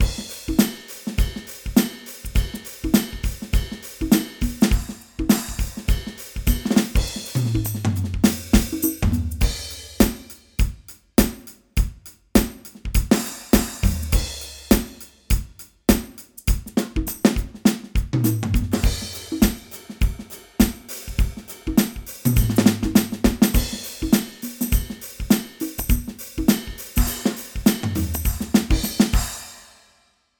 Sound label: Standard DRUM